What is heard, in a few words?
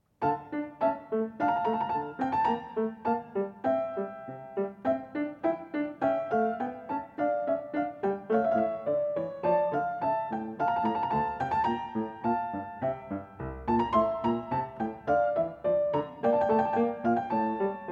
Gavotte; English Suite No. 6 in D minor; BWV 811; BACH; ENGLISH SUITES